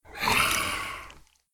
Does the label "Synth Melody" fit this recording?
No